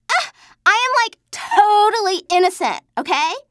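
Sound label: whine; TrueCrimeStreetsOfLA; XBOX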